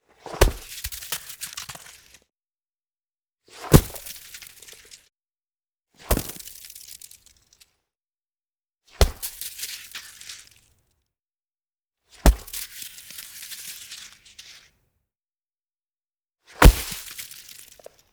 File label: Impact snowball into snow with debris; SkewSound; Frostbite SFX Library